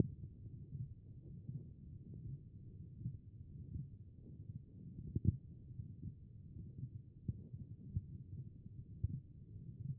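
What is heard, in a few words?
HEART, heart sound; B MODE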